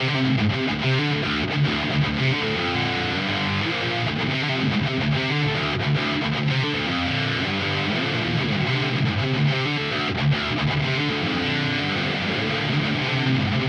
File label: Neural DSP Quad Cortex California